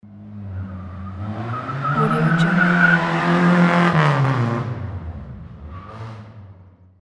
muscle car pass by with squealing tires; alexm76

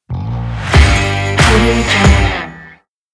a rock logo

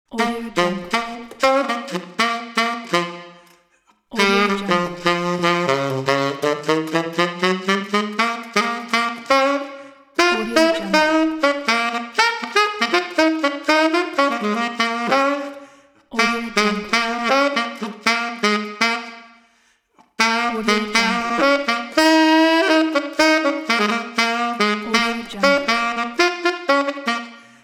tenor saxophone pop solo

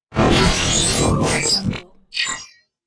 robotical rezonans

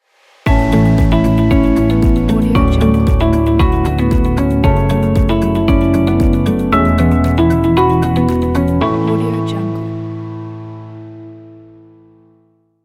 corporate logo

